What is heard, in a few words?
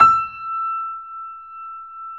E 5, Keyboards Acoustic, GRANDP ST; USB Soundscan vol.17